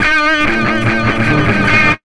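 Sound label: guitar, Electro 125; Big Beat Drumloops; USB Soundscan vol.25; AKAI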